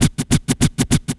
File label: Turntables, Loops